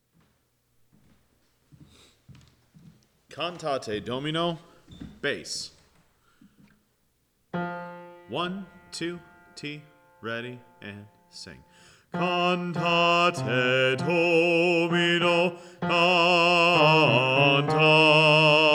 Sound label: Bass, choir; Cantate Domino; Pittoni